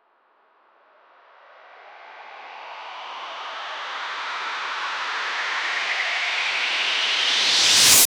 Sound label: uplifting outro